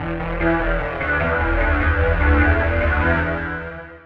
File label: Forest Synth Bass